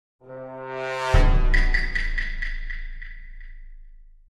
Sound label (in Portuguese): Samsung Galaxy S22 Notificação